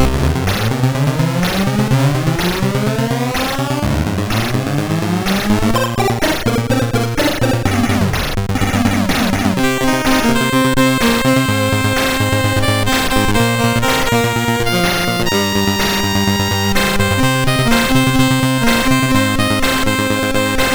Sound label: Intro, MOS6581R4; Omega Cracking Team